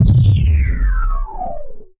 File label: Multimedia Internet CD-Rom Flash Blast